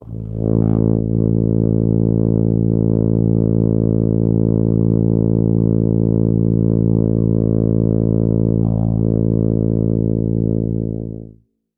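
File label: sounds of tuba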